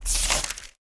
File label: Page turn